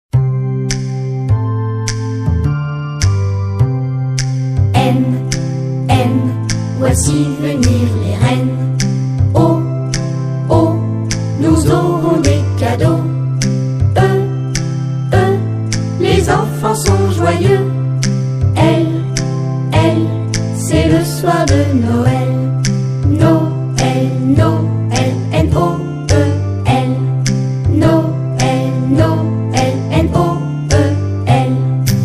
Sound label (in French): canon de noel version courte